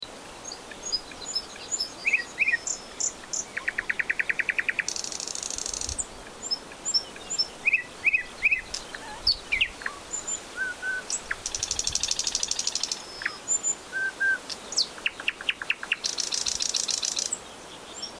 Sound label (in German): sprosser